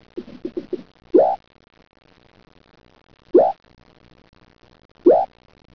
Nassunia, treehoppers; Cocroft

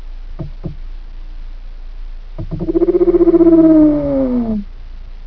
Umbonia, treehoppers; Cocroft

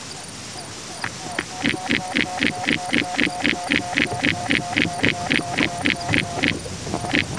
Thelia, treehoppers; Cocroft

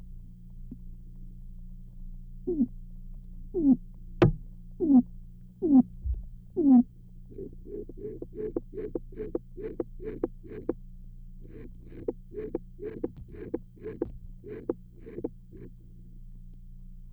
Ischnocentrus, treehoppers; Cocroft

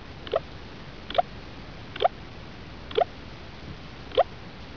Trinarea, treehoppers; Cocroft

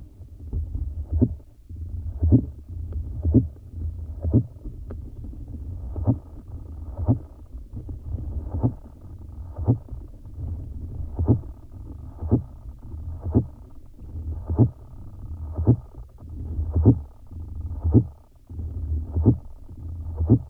Metcalfiella, treehoppers; Cocroft

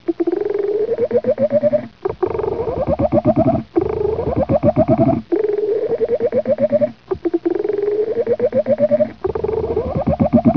Heteronotus, treehoppers; Cocroft

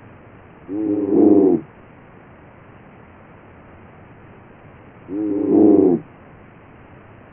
Calloconophora nymphs, treehoppers; Cocroft

